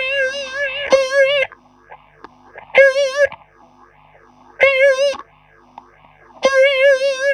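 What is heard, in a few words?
guitar, Roots Disco; USB Soundscan vol.32; AKAI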